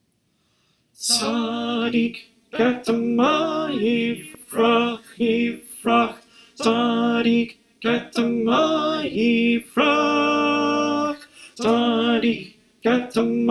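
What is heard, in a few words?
Alto, choir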